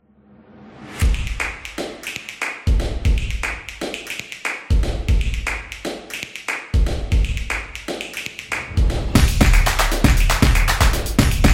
Drums Opener

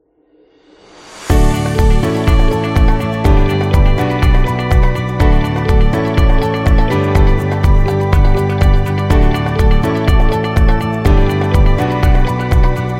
Business Dynamic; agency promo

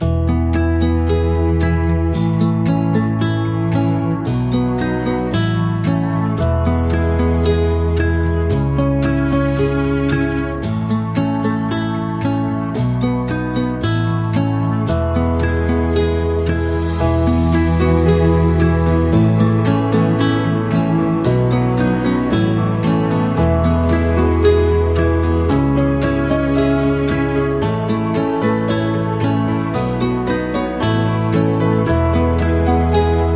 Acoustic Guitar Romantic; happy music vol 10

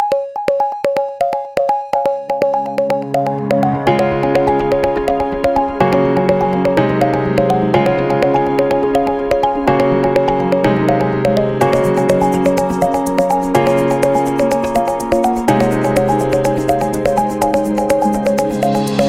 Corporate Tech

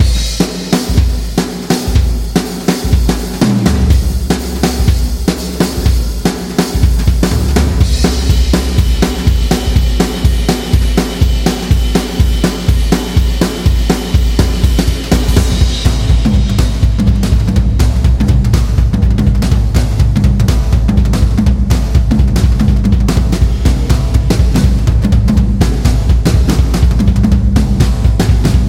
Jazz Drums

